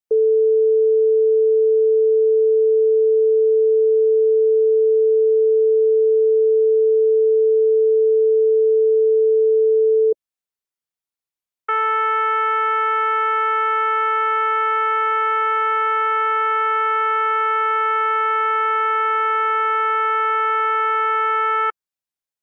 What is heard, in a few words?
440 Hz